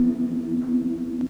Ghost Pad; Lost Tapes From Mars

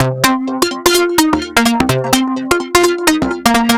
Synths

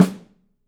PICOLO; 3D Drums

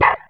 WOW BREATH; E-MU Formula 4000 Series Vol. 2

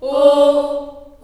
D3 child oh, Choir; Acoustic & Synth; USB Soundscan vol.28; AKAI; CHILD OHS

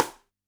rim, Yamaha DD-65

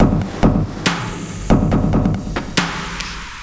SloMo Beats, Loops 140 BPM

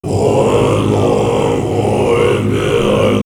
Monks, vox; Bardo Thödol; X-Static Goldmine; vox mix

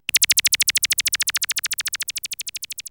OSCILLATOR

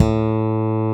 tite bass A3; Drums In Your FACE!

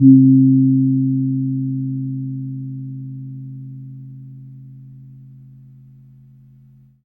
KEMPUR, KEMPUR GONG; Spectrasonics; Heart of Asia

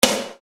Snare Dr

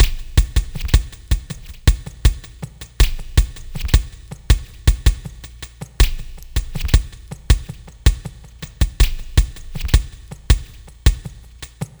Glitched, Processed Percussion

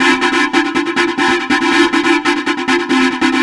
NRG Pad

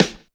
SD, Dance Mega Drums; Best Service; SD-HIP

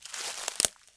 Crunchy Step, outdoor, Footsteps

